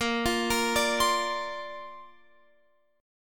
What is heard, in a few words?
A sharp Major Add 9th, Guitar Open F; A#add9; arpeggiated; x,17,17,17,17,19